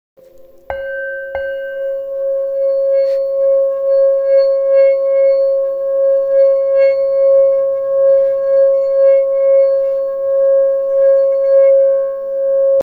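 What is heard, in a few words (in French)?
bol martelé, Bol tibétain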